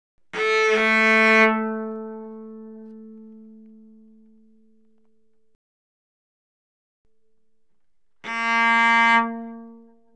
Cello arco ff sul A A3B3; Strings

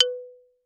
Xylophone rosewood ff B4 stereo; MIS Pitches - 2014; Percussion

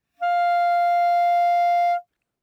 Bb Clarinet ff F5 stereo; Woodwinds

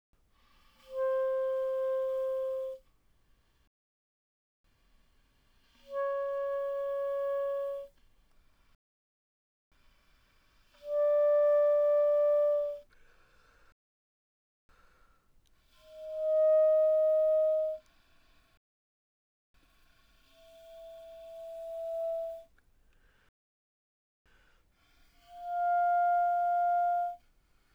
Bb Clar pp C5 B5, Bb clarinet, woodwinds

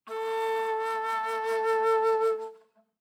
Bass Flute ff A4; Woodwinds

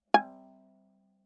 Viola pizz ff sul D Ab5; MIS Pitches - 2014; Strings

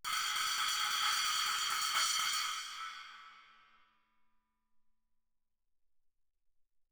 splash, stick, roll, suspended cymbals; Percussion

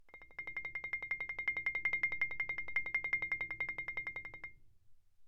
Marimba roll pp C7; Percussion

